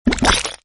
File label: Bounce